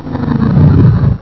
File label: die, monsters; AoMClassic